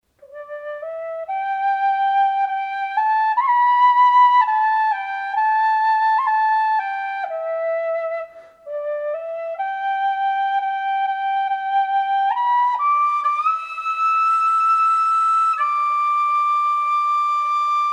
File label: wood whistle